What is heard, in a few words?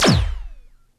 Small Multi Beam